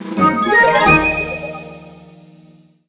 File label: BGM Jingle Seed Complete; mario wonder